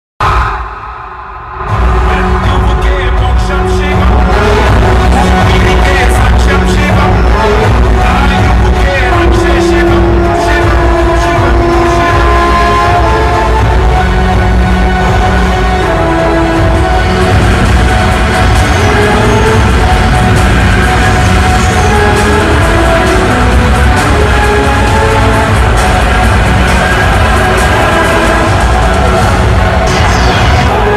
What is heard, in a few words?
climax bgm ringtone; Gaami